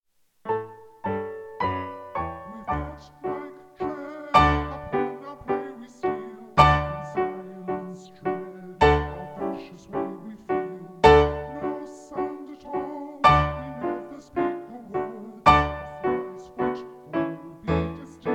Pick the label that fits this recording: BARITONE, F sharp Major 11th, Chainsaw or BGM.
BARITONE